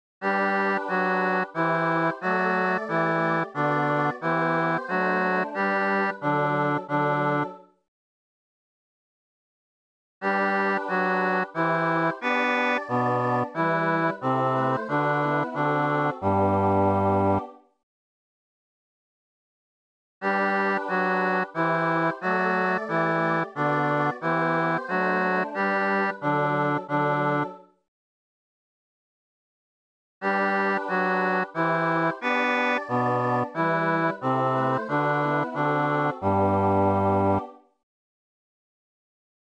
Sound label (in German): wohl mir bas